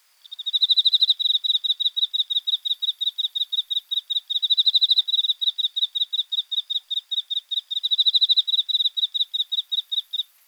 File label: emmakoorogi 20degree, insects; songs of insects